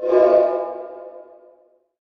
enemy specter interact cast

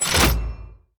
enemy shackler block Tower block

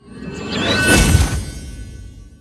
crystal buff

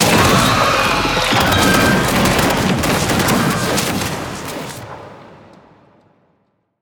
spiders bossfight dead

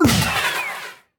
enemy brute welder death; sfx

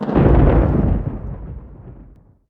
storm ambience; Bluegale

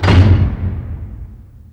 Quest Failed

